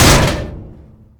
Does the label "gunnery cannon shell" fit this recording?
Yes